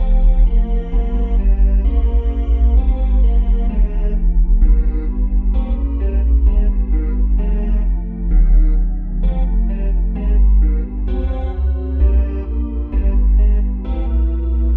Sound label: defeat